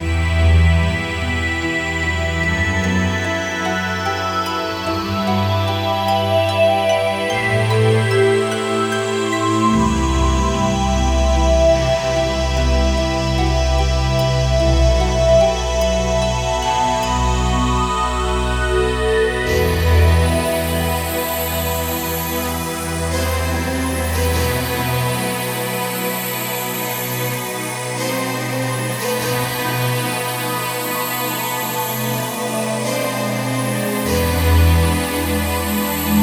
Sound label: music for relaxation; chopin